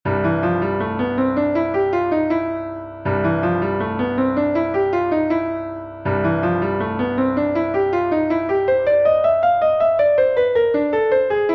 Jazz Exercise

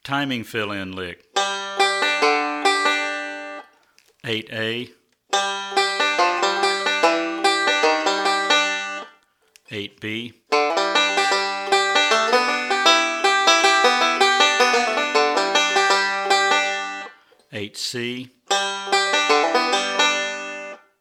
Timing Fill-In Lick

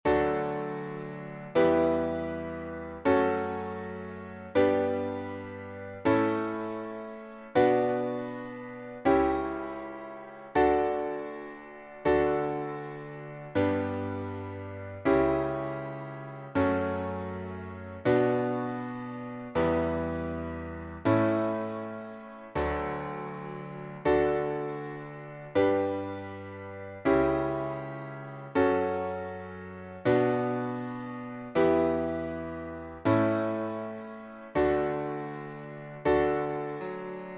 Key Pages - Full Diatonic Major - D

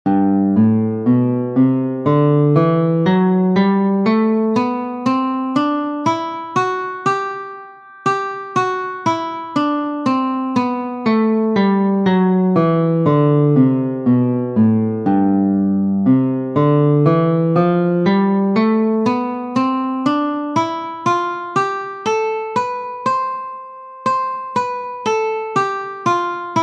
Major Scales Guitar; Major Scales 1: Guitar; Sound Prisms Guitar Level 1

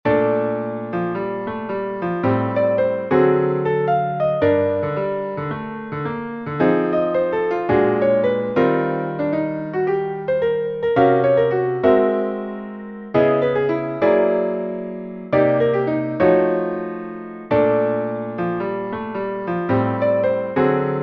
Jazz Exercise

